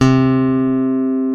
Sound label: BASSES, Roland